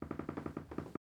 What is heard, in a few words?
Household Bed Creaks